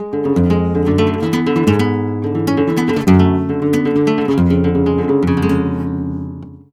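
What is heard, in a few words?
Flamenco Guitar phrase c#; Best Service ProSamples vol.46 - Flamenco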